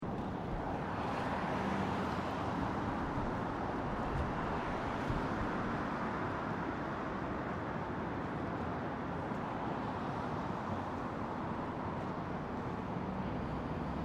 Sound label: Traffic Road Mid Day Traffic From Bridge, Semi distant road recording with medium heav; Semi distant road recording with medium heavy traffic passing. Some unintelligible voices.; Traffic And Car Sound Effects; Lynne Music